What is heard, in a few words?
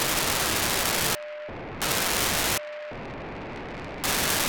435 MHz 1k2 GMSK AX100 Mode 5 beacon, satellite; Simba; 435MHz310; On September 4th 2022 at 11:16 UTC Daniel DL7NDR received on 435.310 MHz the 1200bd GMSK b